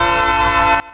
Short, Train Horns; Nathan P3; PFx Brick

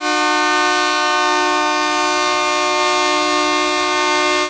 Alco Long, Transport Trains Horns

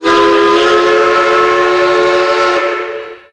Steam Whistle; Trains; Whistles; PFx Brick

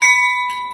Bell, Trains

